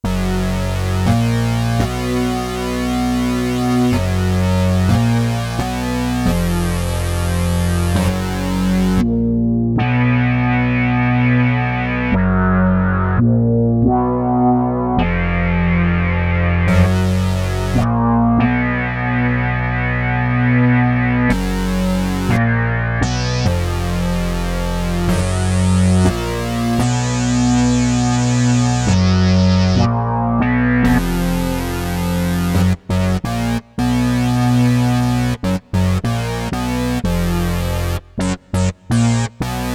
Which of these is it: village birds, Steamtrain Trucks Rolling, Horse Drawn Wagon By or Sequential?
Sequential